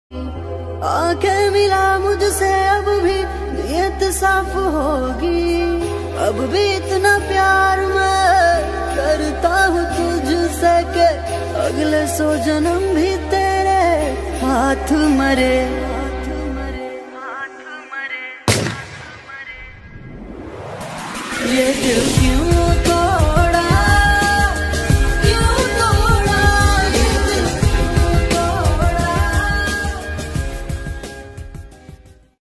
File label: Sad Ringtone